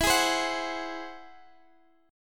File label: G sharp Augmented 7th